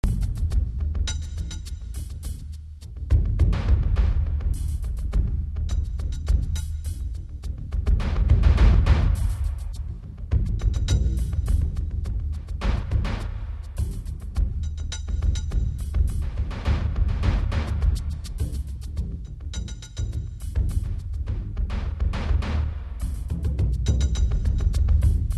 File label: groove